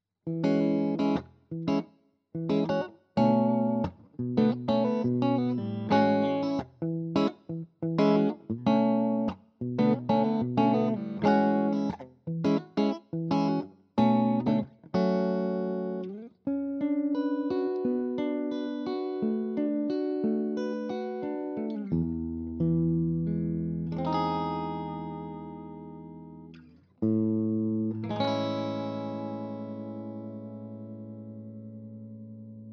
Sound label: Orange Rocker 15 Terror Natural